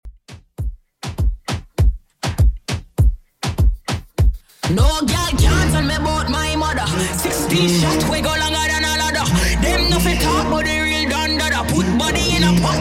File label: Moombahton 100bpm